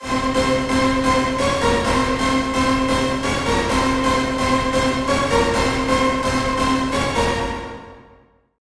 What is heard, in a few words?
Dance Arpeggio